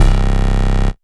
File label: Bass C, Offbeat Bass; vengeance essential club sounds-3